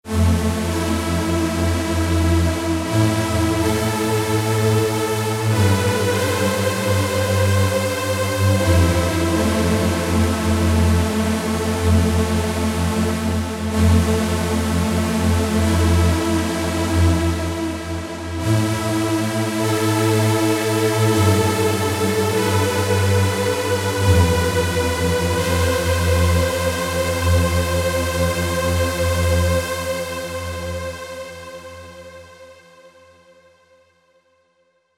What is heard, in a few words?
SYNTH TEXTURES Pad; Imperia Pad; Apple GarageBand 2